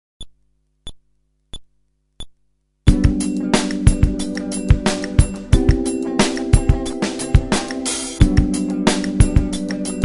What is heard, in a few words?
Groove ベース無し